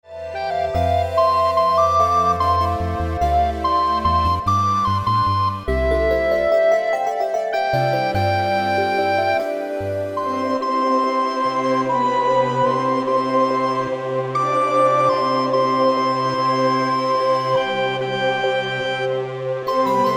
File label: Recorder Ensemble